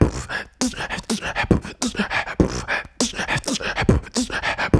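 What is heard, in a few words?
108 bpm, scratch groove; Scrth Groove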